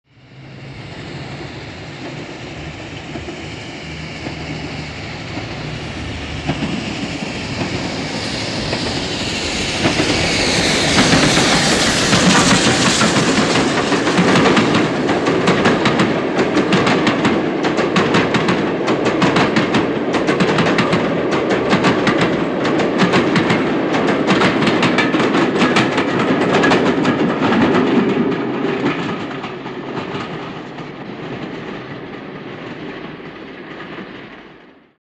By Slow Steam Trai

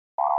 Double Buzz Beep Hi

